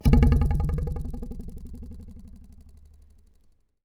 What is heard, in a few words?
Ruler